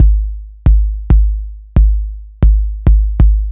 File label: Anabeat Kick